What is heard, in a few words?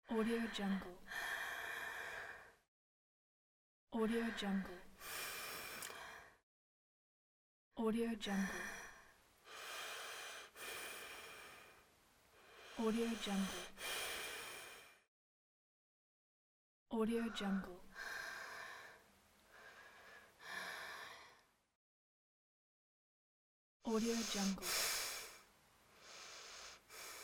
woman breathing